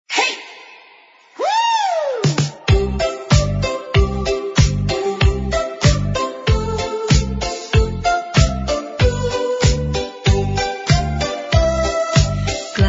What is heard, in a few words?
Jingle Bells (Retro Soul Christmas Remix)